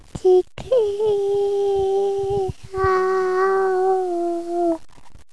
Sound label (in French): objet sonore; Mahé; bande sonore à l'origine de la pièce; Fabriques, pièce pour piano